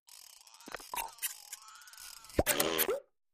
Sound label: Circus Machine L / R Ch. Cartoony, Robotic.